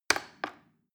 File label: plastic button little click regular slow